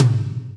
high tome drum sample c key

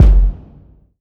deep kick drum single hit a sharp key; kick drum samples

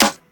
crispy snare drum c key; Duration 0:01; Mono

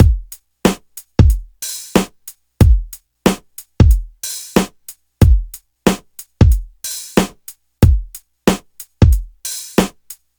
92 bpm breakbeat f sharp key

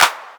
hand clap sound a sharp key; single hits one shots

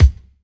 kick drum single shot c sharp key; kick drum samples

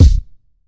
huge bass drum sample E key

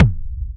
focused bass drum sound f sharp key

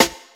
sizzly steel snare drum a sharp key

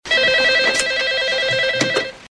old telephone ring; digital

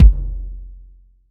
puffy kick single shot f key; kick drum samples

